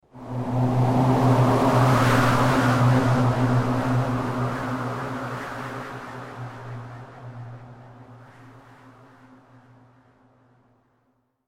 Evil Wind Gust Horror Vocal, Evil Wind Gust Vocal Scary Dark Ominous Demonic Whoosh; Ghosts